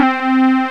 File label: Lead 3 (C3); X-Wing; X-Wing Patches